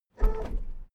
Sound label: car lift up short